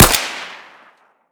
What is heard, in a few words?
g36c suppressed fire; weapons